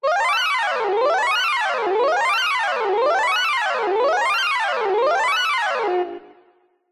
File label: squeaky metal Designed Vintage; PMSFX